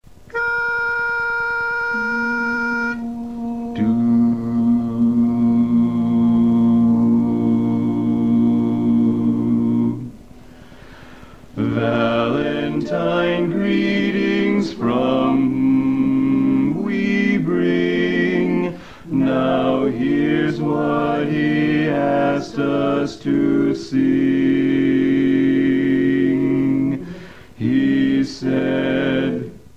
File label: BASS Bb Greetings, barbershop quartet